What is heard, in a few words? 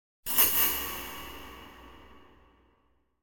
Impact Thin Pointed (double) Impact; Hollywood Edge; sound designer's toolkit v2